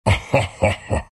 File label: treant laugh; dota